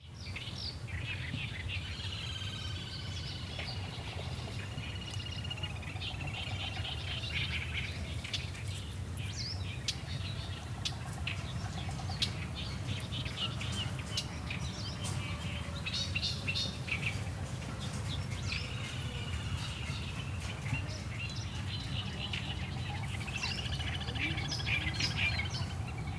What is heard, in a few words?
Birds of Dali, china; Les Cartes Postales Sonores; NOISE ON EARTH Vol6